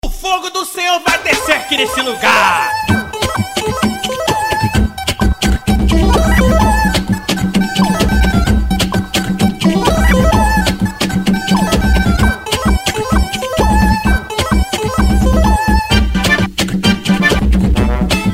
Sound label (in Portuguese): aquecimento aleluia montagem; juniordj